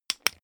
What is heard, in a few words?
switch